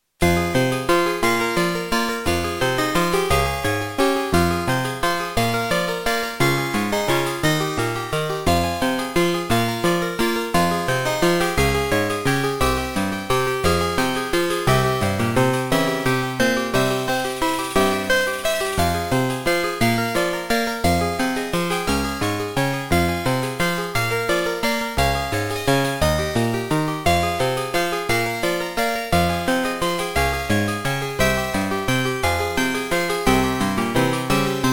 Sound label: Track 6, Beepblaster 2.0 BEEP MODE; Death Gate